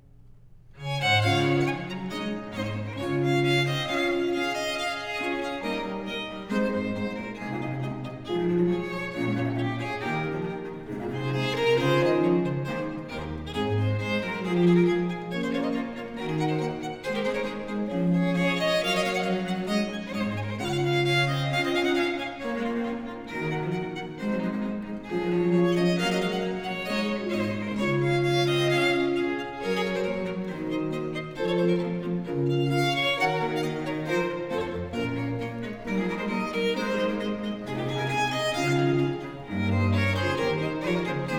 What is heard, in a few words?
Suite in D Major, Violin; Pièces de violes; Book 4, No. 43-46; Nicola Matteis; Ayres for the Violin; Quatrième Livre (Paris 1717)